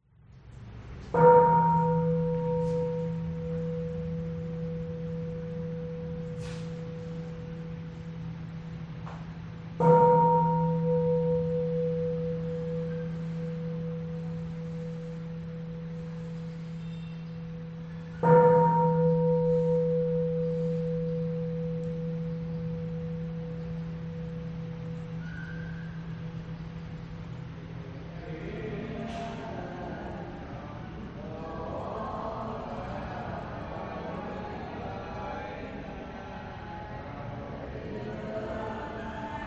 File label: Inside A Caodaism Church Bell Prayer vietnam